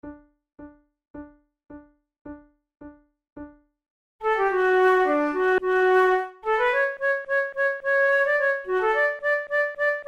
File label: duet, flute